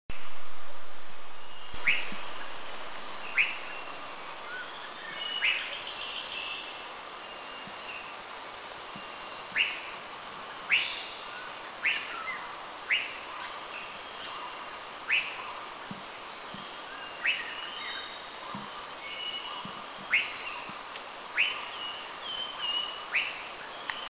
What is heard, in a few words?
Coral-billed Scimitar Babbler Call; Eaglenest; India